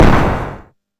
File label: Small explosion; Hovl Studio; SFX Battle sounds; WhiteMan Unity2D